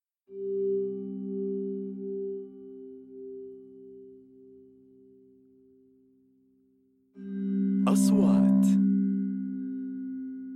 Horror

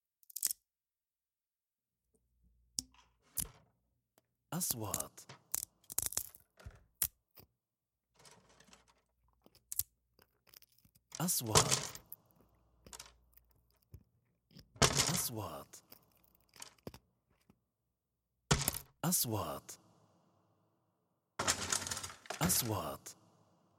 Wood

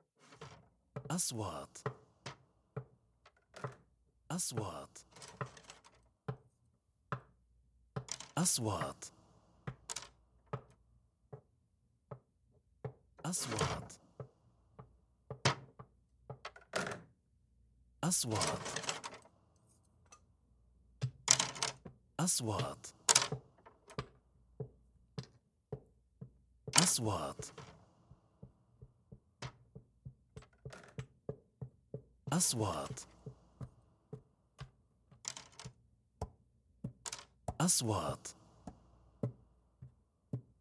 Wood

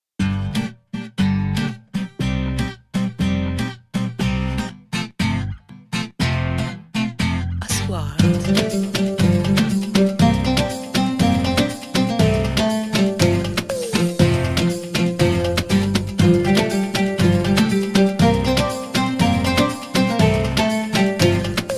events

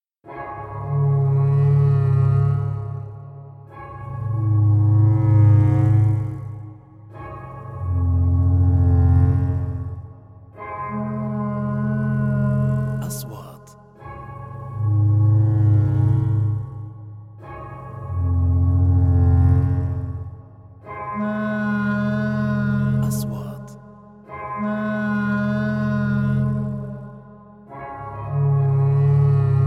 horror

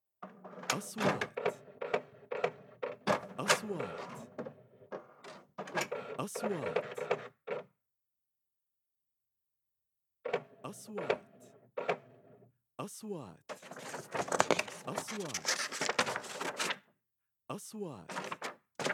typewriter old, surround

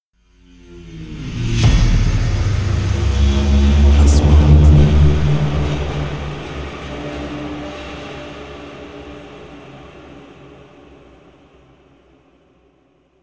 3D SFX Triler; مؤثرات صوتية

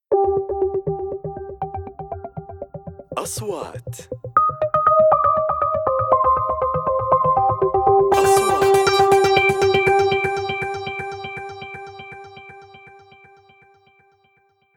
intro arb

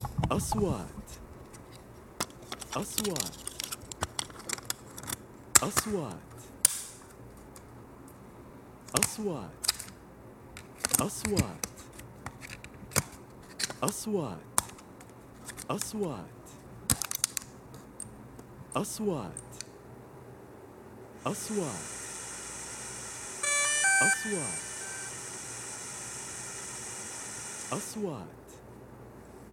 DRONE TURNING ON

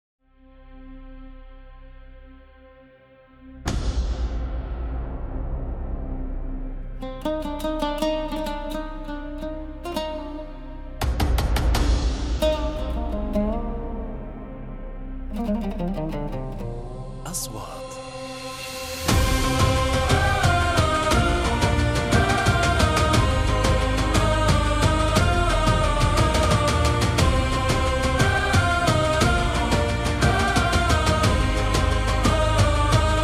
epic

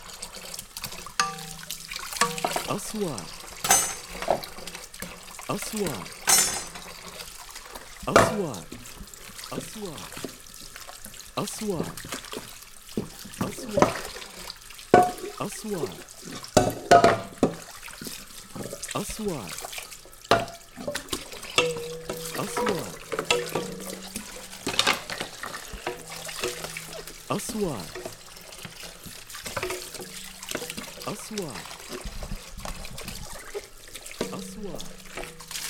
Audio Laundry Aquarium; kitchen

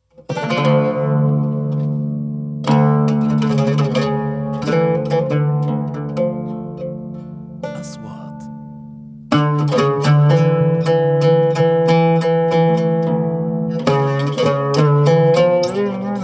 OUD TQASEEM

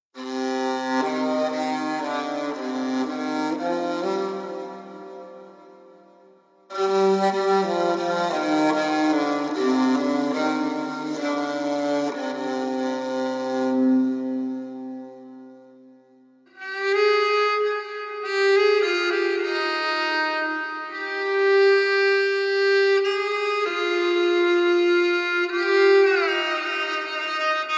documentary

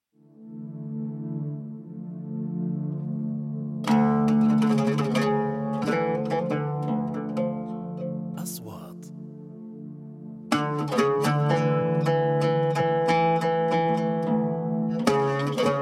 oud pad; OUD TQSIM

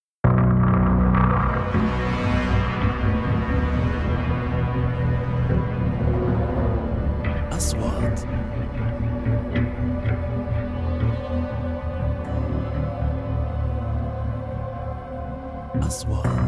Music Horror

